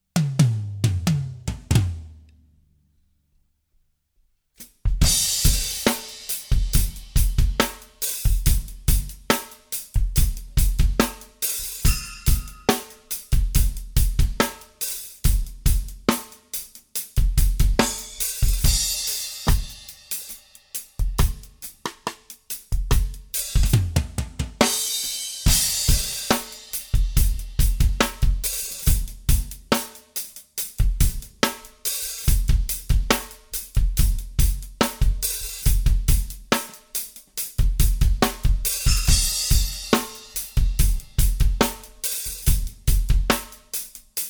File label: Pearl Master Studio Hiphop Beat 141 bpm